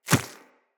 Inf Small Walk Default, Footsteps; Infested

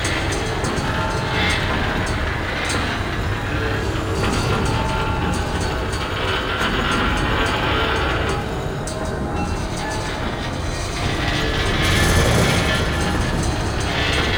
Spinning Metal Rings Loop; Warframe; Ambience; Entrati

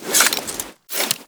Unequip, Weapons; Warframe; Tenno; Soma Kama

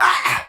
Pain; Warframe; Tau; Dax; Ashigaru

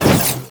Spin Whoosh, Weapons; Warframe; Dual Zoren Prime

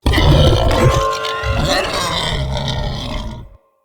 Corrupted Melee Attack Heavy Growl; Vocals; Bromius; Animals

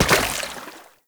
Step Water, Foley; Warframe; Oraxia

